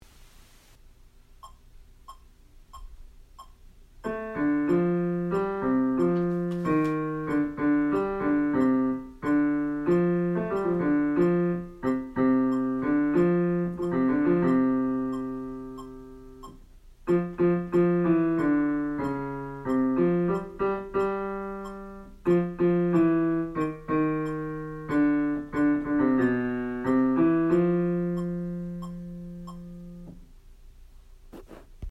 Farm Ballad Bass; Accompaniments

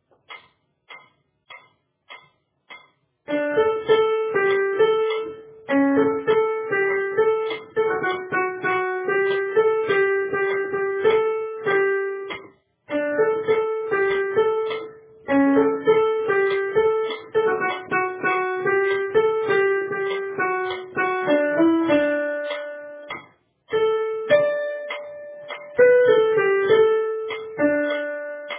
Forgot Who I Am Soprano, Accompaniments

